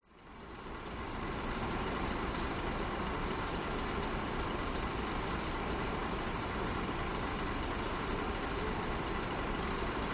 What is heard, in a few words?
bus station quiet bus idles occ person chat thailand chiangmai